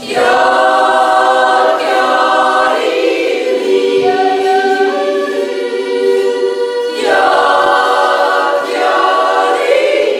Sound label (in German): jodelt; WeiberWirtschaft